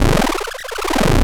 LFO, Noise

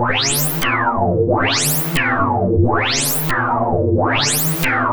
PROPHE FX, SYNTH FX; AKAI S-Series CD-ROM Sound Library VOL-6

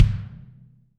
BD, Real Mega Drums

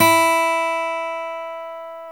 GTR E4, Dual Guitar; Club-50 - Foundations; Paul

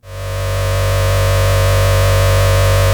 VOY8 SPACE, VOYETRA 8; Greytsounds VOL-1

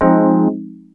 wurlitzer, dx7

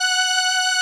SOLINA, String Machines

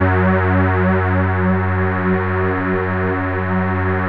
MOVE BASS; Masterbits; Vintage Voltage

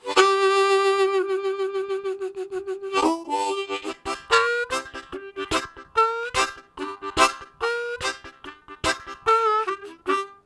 Steam Blow, Harmonica; Zero G

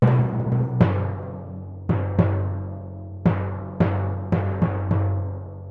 110 timpani, F#min, Orchestral arrangements; PowerFX - Downtown Orchestra

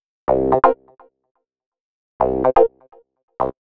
125 Clavy Riff C, Keys, Nu Jazz House; PowerFX - Nu Jazz House

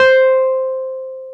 PNO Wurly; Keyboards of The 60's and 70's

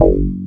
RESO BASS

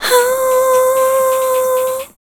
VOC F, Dance Vocals, FEMALE

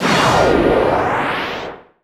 TOMS + HH; Best Service; Hyper Dance

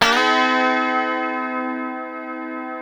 A#3D FUNK CHD, Guitars; Spectrasonics; Hans Zimmer Guitars VOL-1; FUNK CHORDS

